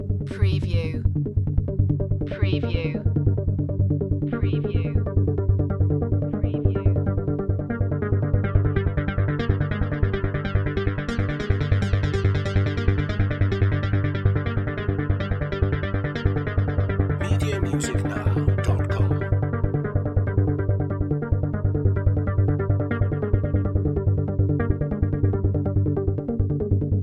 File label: Techno-Trance Synth Arpeggio Loop JX8P in G 142 BPM